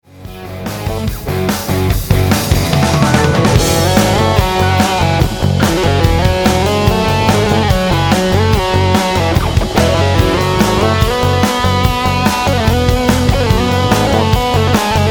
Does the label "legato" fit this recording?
Yes